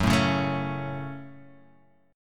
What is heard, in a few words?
Gb Minor, Guitar, Half Step Down